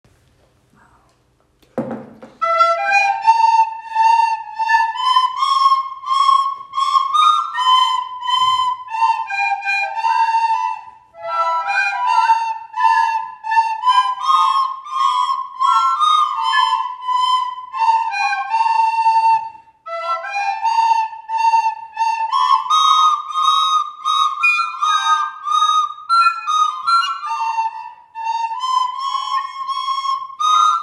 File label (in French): La flute; Les cours à options